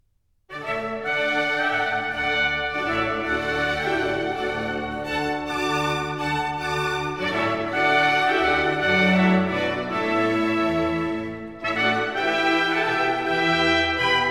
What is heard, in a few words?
Suite in D major, Lentement; Water Music Suite No.2; Handel Masterworks; Klassika